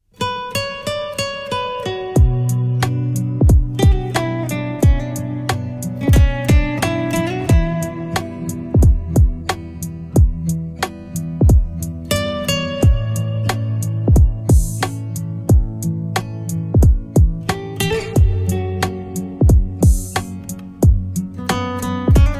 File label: guitar cover